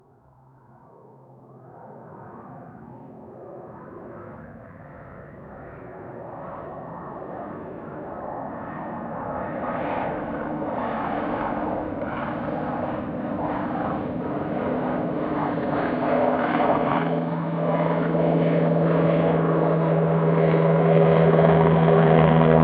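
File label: aircraft turbine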